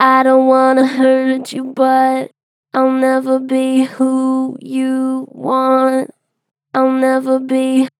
Str Flat I dont wanna hurt you, Vocals, Pitch Flat Strong